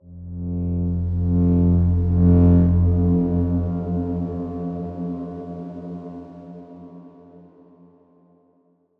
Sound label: Darkswarm F1 pp; HSS Ableton Pack1